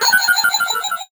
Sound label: VocoBit Noise, 8-bit; musicradar; 8-bit bonanza